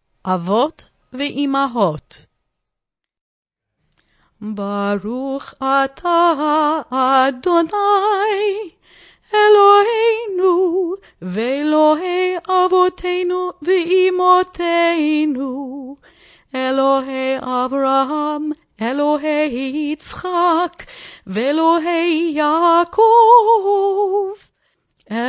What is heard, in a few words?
avot chant